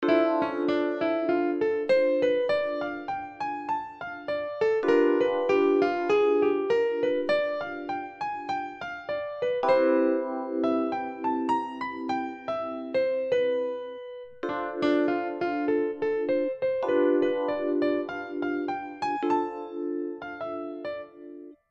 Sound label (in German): langsamer mit Chords; Jazz